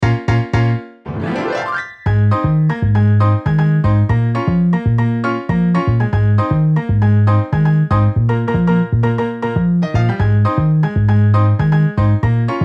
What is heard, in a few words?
Piano Bass MIDI